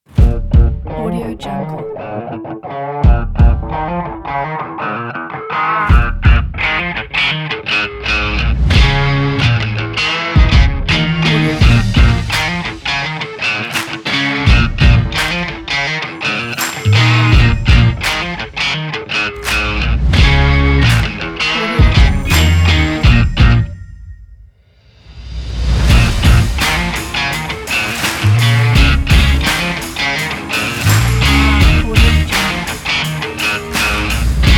driving upbeat rock

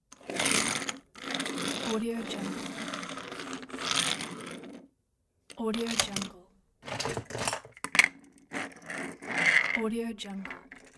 playing with plastic toy cars

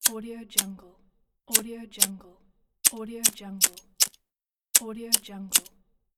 the lighter; blackdragonfx

